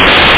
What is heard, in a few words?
stick